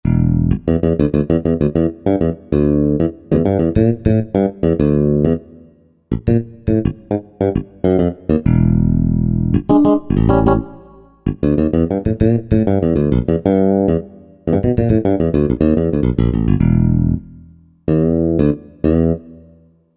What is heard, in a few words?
Round Wound, Korg Wavestation